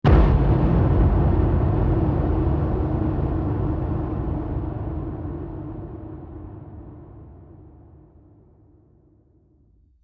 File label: Game sound when a br